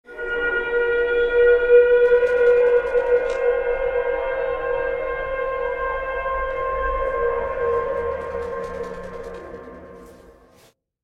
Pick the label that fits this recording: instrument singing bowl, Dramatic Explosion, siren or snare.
siren